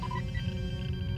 Bridge Sounds; Defiant; trek

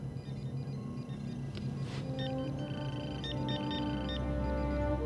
Science Lab Sounds; trek

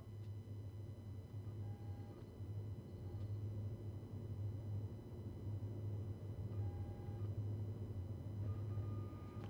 Station Hum; Trek

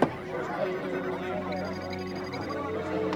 Dart Bulls Eye; Trek

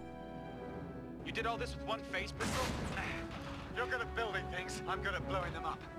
build blowup; trek

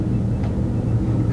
Shuttlepod Sounds; trek

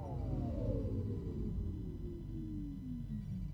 Runabout Engine Shutdown; trek

